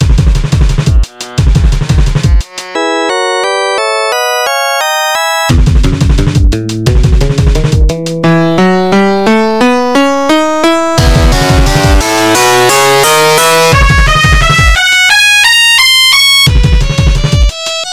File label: D flat melodic minor